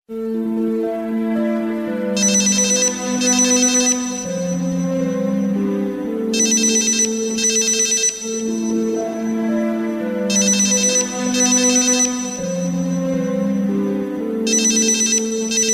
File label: soft ring ringtone